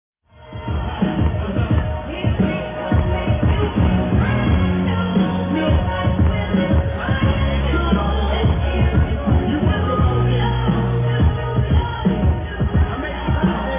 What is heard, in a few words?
Disco; Arezzo